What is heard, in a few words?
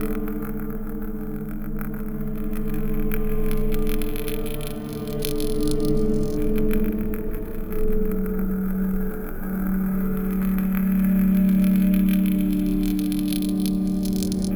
Textural Soundscape, IDM; Sonic Foundry (Sony Creative Software); Twine-IDM